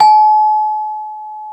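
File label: BEL CELES, celesta; Roland - LA Composer vol. 1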